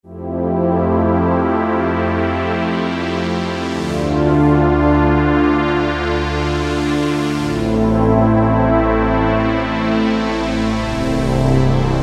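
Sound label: BIG PAD SWEEP; Akai SG01v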